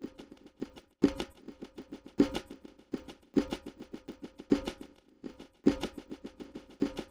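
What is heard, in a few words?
drum separated, convolutive sparse coding; Separation of Sound Sources by Convolutive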